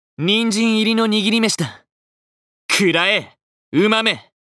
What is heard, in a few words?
horse; Uguisu